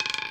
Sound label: center Roll Short, roulette; europeanroulette3